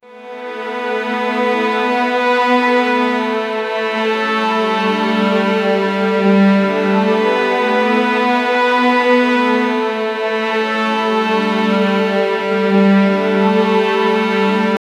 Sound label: music somber strings; classical